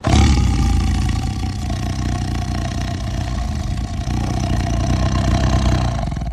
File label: Tiger Exhale Spliced; big cats